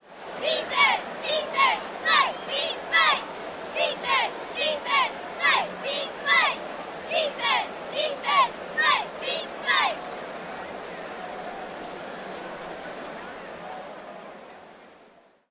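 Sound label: football cheerlead, chants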